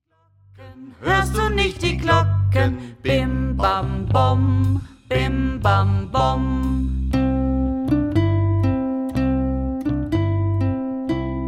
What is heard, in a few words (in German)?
ohne ukulele; bruder jakob